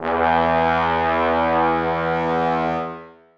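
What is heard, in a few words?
trombones sustain e2; Sonatina Symphonic Orchestra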